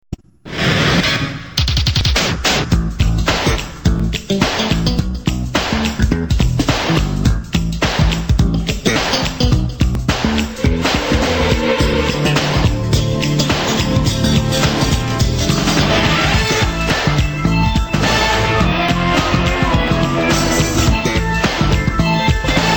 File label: theme instrumental